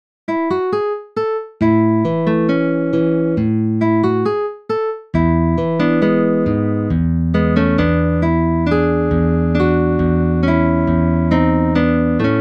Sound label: Guitar Solo in A Major